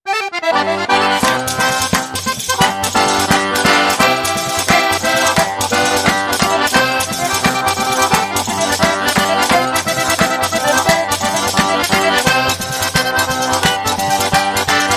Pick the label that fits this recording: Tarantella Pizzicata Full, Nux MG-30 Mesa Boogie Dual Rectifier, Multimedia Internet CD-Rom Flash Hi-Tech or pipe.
Tarantella Pizzicata Full